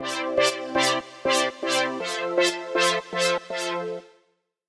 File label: synth1 tal4